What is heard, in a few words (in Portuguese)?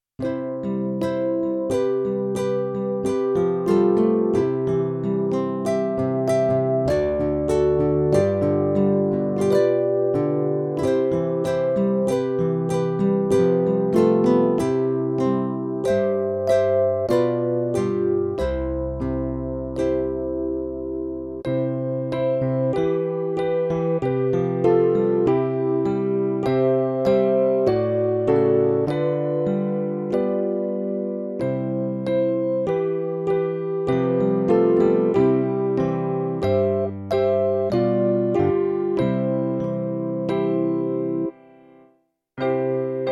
playback hino